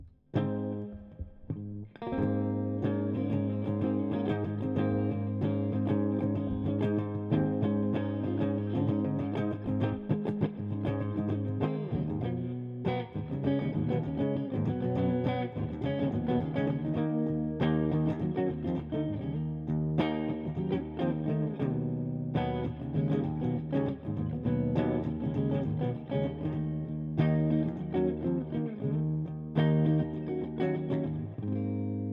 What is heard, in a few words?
GTR Clean 55 Deg; 97 Percent Fire Studio